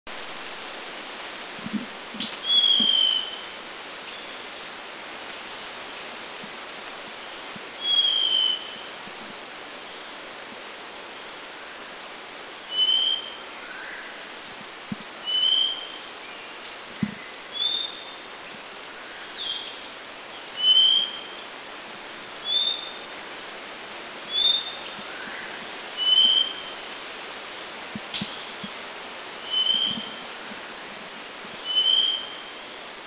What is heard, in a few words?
Spot-necked Babbler, Laos